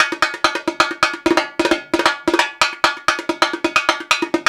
Rio Batucada, 130 bpm

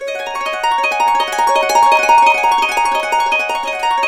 DB, Harps; Complete Harps; USB Soundscan vol.62; AKAI

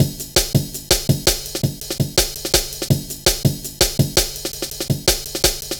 Drum Loops, 165.5; Zero-G Jungle Warfare 1, 2 & 3

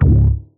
bass stab

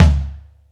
Bo Tom, Tom One-Shots; KJ Sawka - Drum n Bass & Beyond; Drum Tool Box

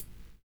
LOVE SNAR; Univers Sons

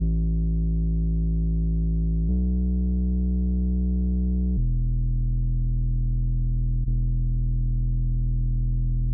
Emaj 105BPM Bass; 99Sounds; Monosounds; Music Loops; PANDORA BOX!; Radio Station

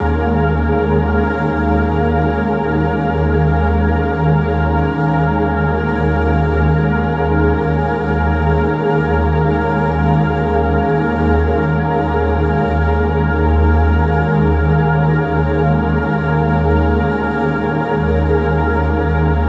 pad loop 150 D#min; Virtual Riot

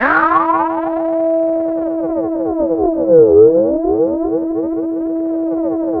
SPACE AGE E4; Drums In Your FACE!

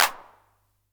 909 Clap Tape; Perc; Goldbaby Tape808 & Tape909; The Tape 909

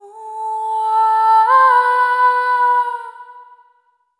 Single Voice Melodic Loops, Vocal Samples

